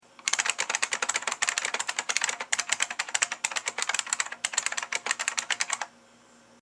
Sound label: buckling spring